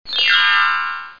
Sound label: Door open